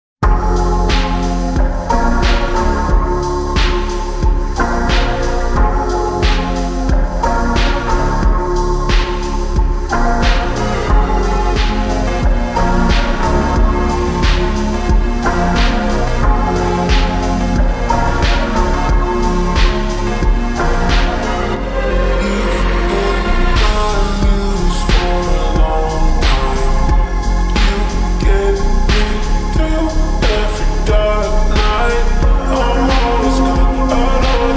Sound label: slowed down reverb